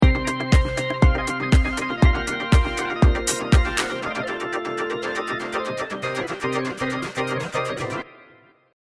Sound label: Short Synth